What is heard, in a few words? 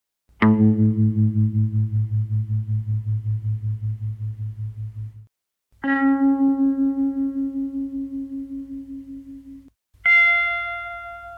guitar, mellotron